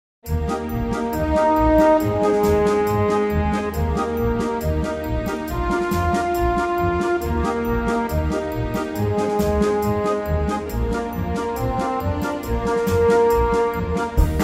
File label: Polka Style